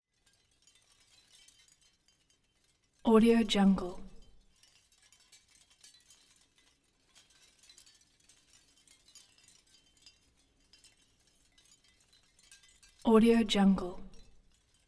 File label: int house fish scale chimes